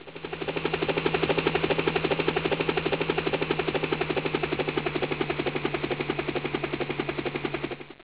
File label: HELI; Johnny Ice: Digital Detective